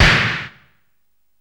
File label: INDUSTRY